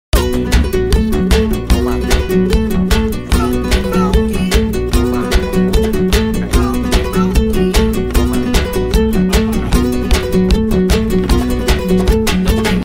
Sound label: Gitara Funky